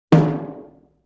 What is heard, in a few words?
High Tom Hit; Vintage Cartoon; Drums & Percussion